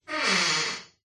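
household door wooden creak room perspective distance reverb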